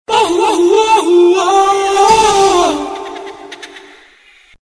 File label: Jazz